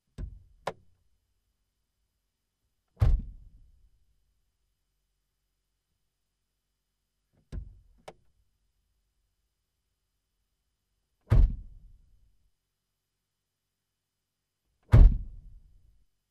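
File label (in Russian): Фольксваген Гольф двери открывают и закрывают изнутри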